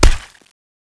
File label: bomb drop; Boss Megalodon